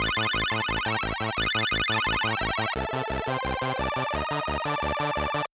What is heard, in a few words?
alarm retro; Razer Phone-2; alarms